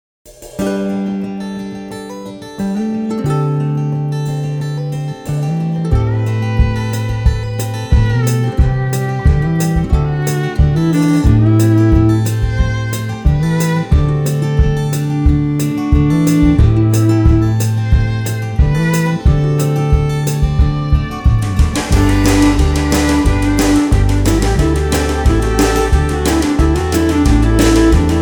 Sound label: Blue in the Grass